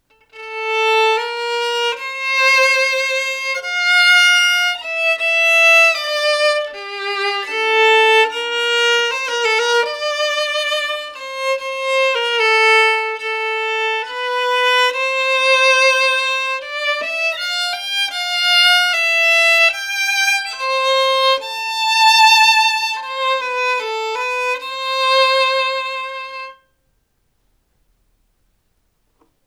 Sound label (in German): Werkstatt Melodie, Geigenbau; Violins; Werkstatt 2023